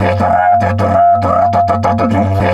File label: DIDGE 100BPM, Didgeridoo; Big Fish Audio - Didgeridoo and Other Primitive Instruments